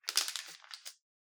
page turns; soundscrate

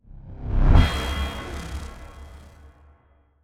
electricity impact; SoundsCrate

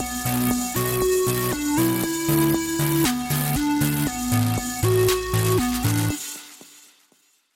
synthish logo; soundscrate

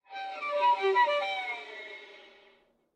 Violin Slide Down

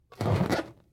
balloon rub short

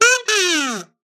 kazoo short fall; soundscrate

